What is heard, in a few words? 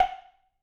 wood block; note; perdachcraft; minecraft